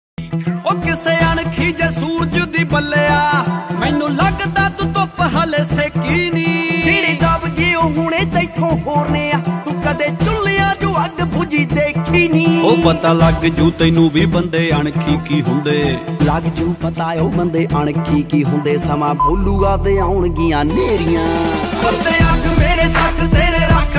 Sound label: Dance Punjabi Ringtone